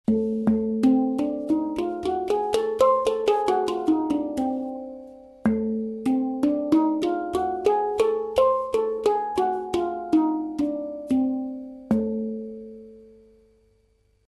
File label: Hungarian Major, hang